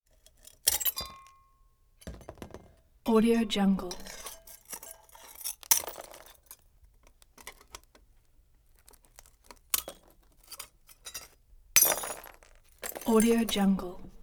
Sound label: split glass